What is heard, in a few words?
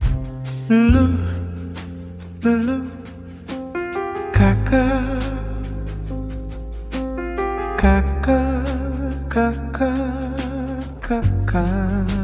jazz